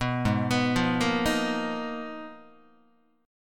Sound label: G sharp Minor 6th Add 9th G sharp Guitar, chord; G#m6add9 arpeggiated; JGuitar